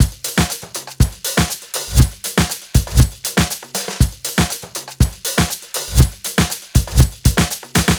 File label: 120 beat basic, Nu Jazz House; PowerFX